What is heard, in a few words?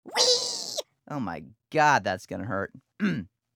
Grunts Vocal abuse; Outtake